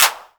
clap, Korg Electribe ER-1

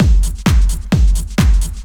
drum 130 techno; Techno Trance Essentials

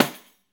Pandiero, Tambourines

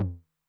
Med Tune Short Decay, 909 LOW TOM; classic machines; LT - LOW TOM

